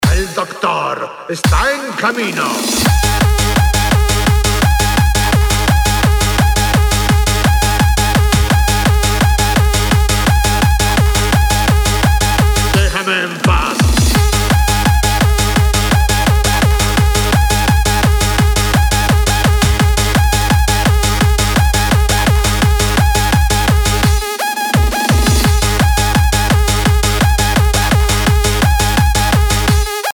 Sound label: Zombie Bass